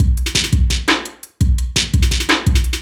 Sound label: Beat, Drum N Bass